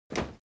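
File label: step crowd run quiet single right, footsteps; schritte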